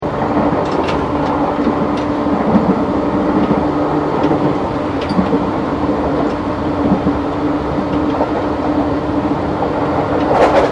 Train JR Regional Aomori Local Connecting Platform Operation Loop, Japanese Trains; Train Sound Effects; Vehicles